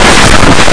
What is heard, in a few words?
book